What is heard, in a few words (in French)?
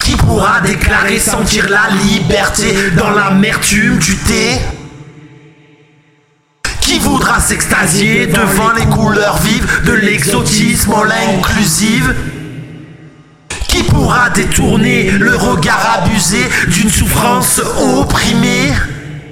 Voix couplet